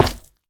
蛙明灯破坏声

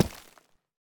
深板岩 破坏声

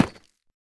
深板岩砖放置声

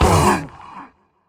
受伤声; 监守者

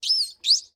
海豚待机声

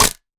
山羊角断裂声

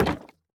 竹木活板门开关声

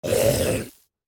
死亡声; 尸壳

